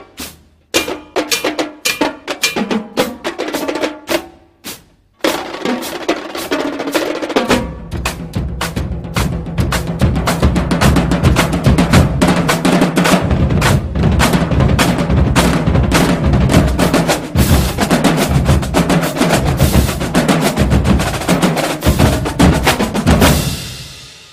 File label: Drumline